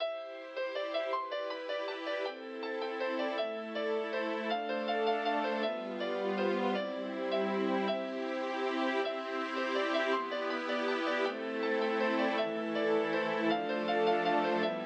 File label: Thoughtful Piano Theme